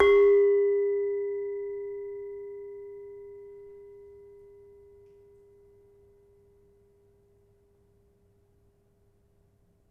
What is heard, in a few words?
Demung hard, gamelan; gravityharps; timbrebox